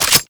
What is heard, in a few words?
Shotgun Reload Loop; Warframe; Tenno; Alchemist